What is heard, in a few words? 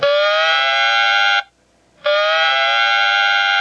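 dive alarm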